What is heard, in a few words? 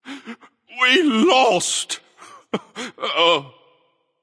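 nonverbal boohoo; smite; voicepack; merlin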